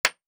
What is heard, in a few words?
Nail Wood; Survival Game Sounds - Lite Edition; Crafting